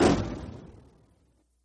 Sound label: bomb; free rider hd